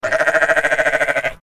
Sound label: sheep sound; ttt supersheep